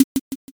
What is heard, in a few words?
m keytap